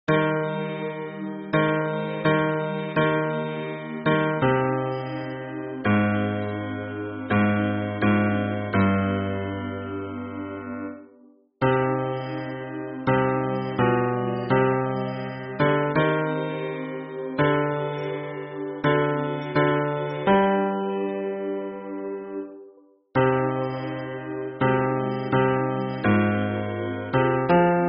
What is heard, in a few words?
hymn bass